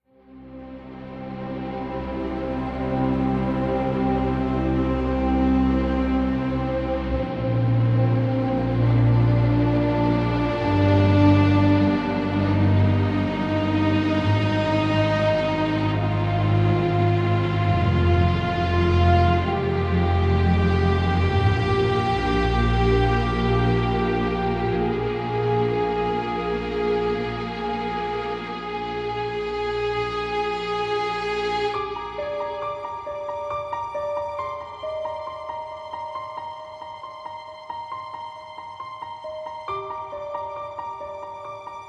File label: The White Birch (Strings Only); Amnesia Fortnight 2012